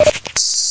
medshot; hl2

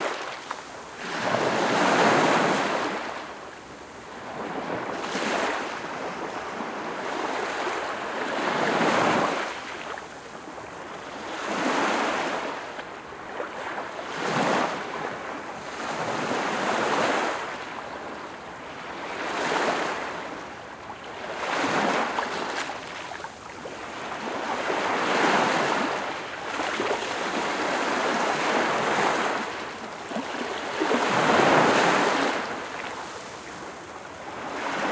water lapping sea waves sandy beach; RedSonic

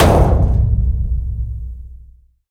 heavy metal impact steel barrel; BlueZone; Heavy Metal Impact Sound Effects

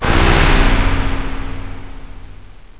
Hit; hl2

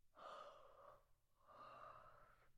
Human, Adult, Female, Yawn; UberDuo; Character Playset

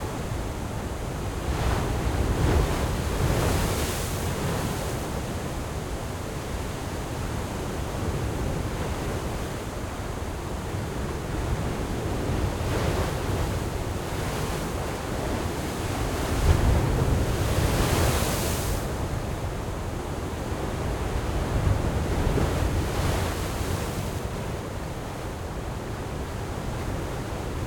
Tunnel heavy waves scirocco, Adriatic sea; Ivo Vicic; Northern Mediterranean soundscapes

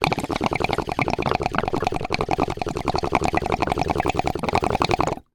Water Pipe Smoke; Soundopolis

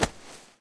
foot, fast zombie; hl2

